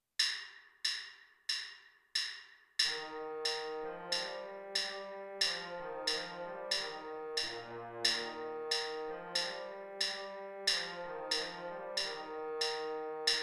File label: alouette trombone